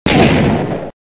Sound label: explo; Minetest; VoxeLibre; mobs mc